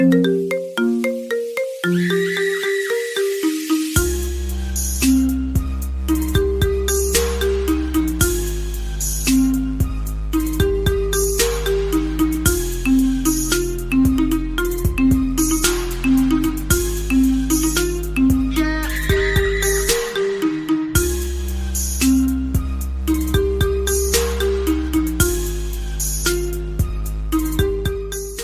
marimba ringtone

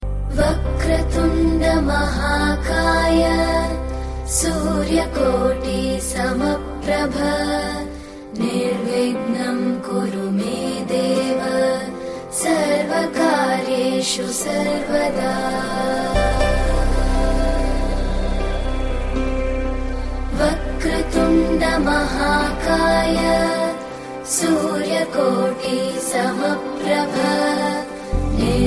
hindu devotional; ringtone

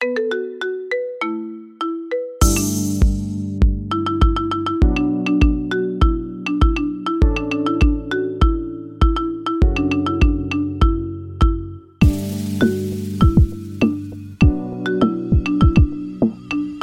Remix Ringtone

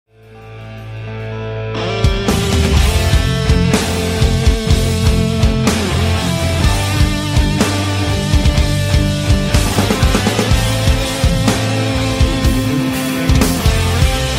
Music Rock Cover; PUBGM

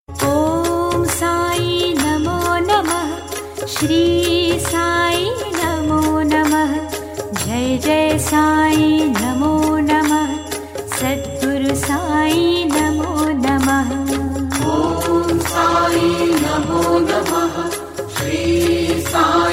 Mantra Ringtone; Sai Nath

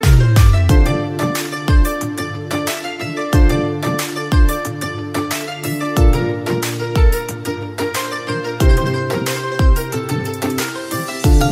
Instrumental Ringtone

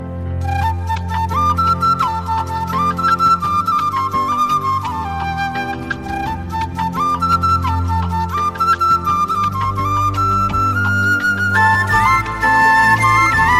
On My Way Flute Ringtone

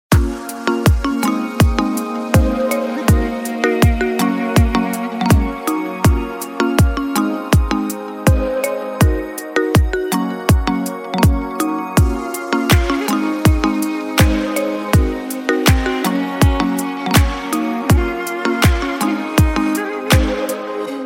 Musical Ringtone

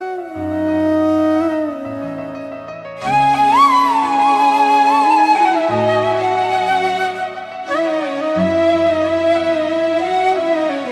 flute ringtone

